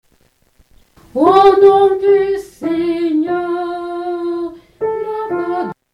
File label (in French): Au Nom du Seigneur Alto